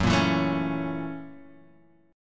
Gb 7th Sharp 9th Gb Guitar Half Step Down

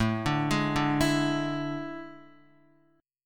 A Major 7th Suspended 4th, Guitar; AM7sus4; arpeggiated; JGuitar

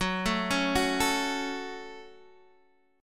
Gb Minor, Guitar, Open D

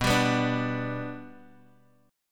B Minor Sharp 5th, Guitar, Drop D

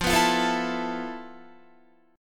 F Major 7th Flat 5th Guitar; strummed

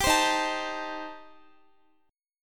D sharp 6th Flat 5th, Guitar; D#6b5; strummed; x,x,17,17,15,16; 4E,4A,5D,5G,5C,6F; chord shape

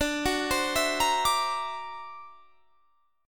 D Augmented 9th down, guitar

